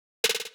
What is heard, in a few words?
Space Drum Loop - 95 BPM Snare Fill; Loop Stems; Cymatics